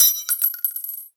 Belt Fed Rifle Links H; Warframe; Tenno; Frumentarius

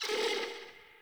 Venus Landscape Mid Creatures, Ambience; Warframe; Venus Open World

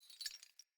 Movement Chains; Characters; Enemy; Foley; Sinecure; Melee Grunt

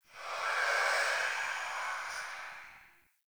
Rifle Reload Void Layer; Weapons; Warframe; Zariman

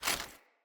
Foley Action Armour Run; Characters; Mother Armour

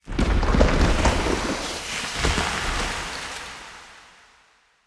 Statue Activate A; Midrath; Champion Hub; Fenn Jotar

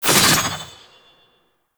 Fairy Sword Swoosh

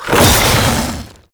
Flame Coil Ability Shot; Warframe; The New War; Archon; Amar; Abilities

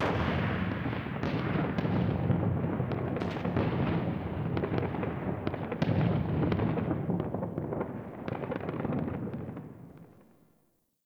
Ambient Distant Explosions; Nineteen Ninety Nine

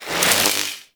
Sniper Zoom In, Weapons; Warframe; Sentient; Caliban Prime Sniper

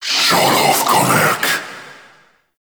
Death Screams; Warframe; Dante

